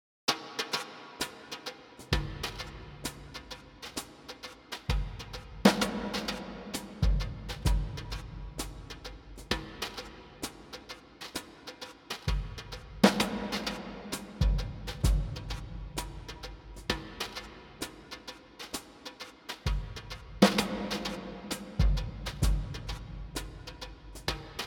SuperPlate Drums EMT 140 Clean Clean Mix50 Decay 4s